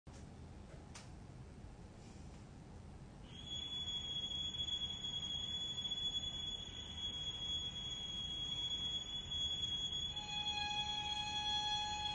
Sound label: strings; naminmin; 弦楽四重奏